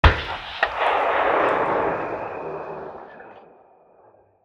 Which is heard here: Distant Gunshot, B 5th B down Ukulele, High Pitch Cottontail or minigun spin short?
Distant Gunshot